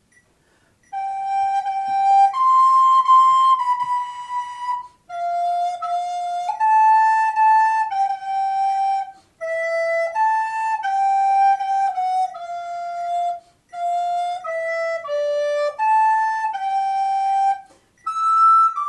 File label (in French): flute